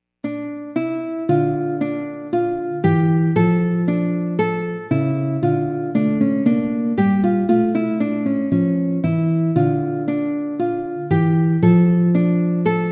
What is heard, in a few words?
bourree